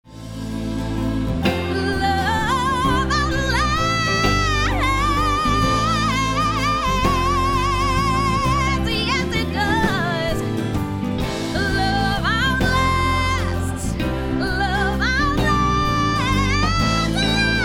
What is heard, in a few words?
HIGH BELT